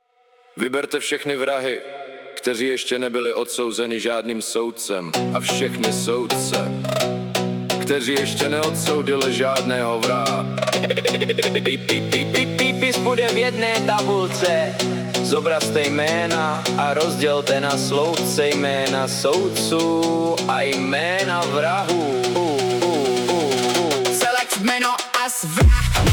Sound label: phonk